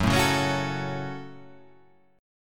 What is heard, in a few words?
F sharp Minor F sharp, Chord